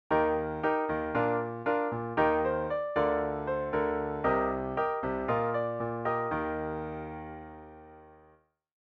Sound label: chords; Eb Bb Eb Bb/D Cm7 Bb Eb